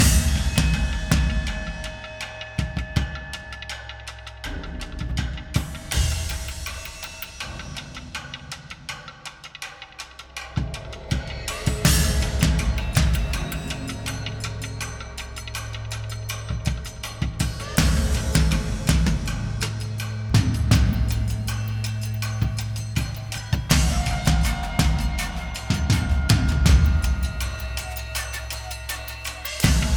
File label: Combat Loop; Harley Police